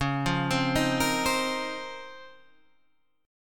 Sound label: Db Major 13th, Guitar Half Step Down